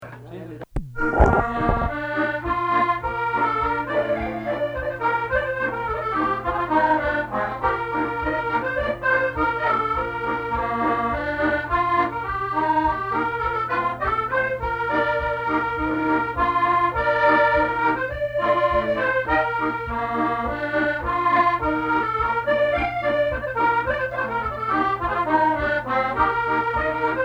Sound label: Scottish marches